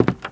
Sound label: STEPS Wood, Creaky, Walk